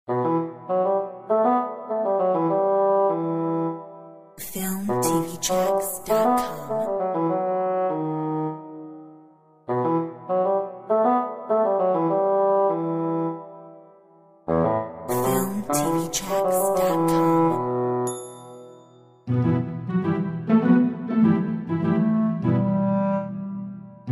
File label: Skipping point